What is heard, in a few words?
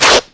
strike, spider; Fate; MONSTERS